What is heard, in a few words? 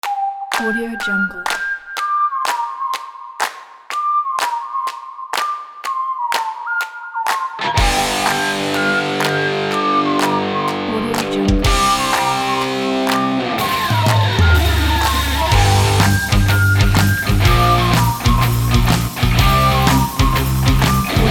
uplifting rock n roll